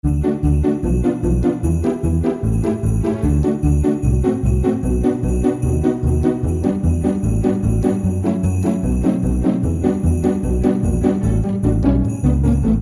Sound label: music loop